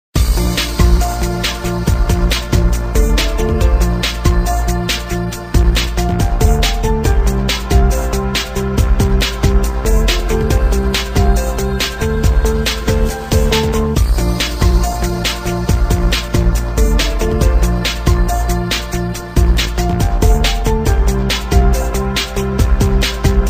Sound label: Remix Beat